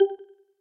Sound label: in call notify